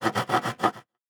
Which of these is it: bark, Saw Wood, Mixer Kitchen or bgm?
Saw Wood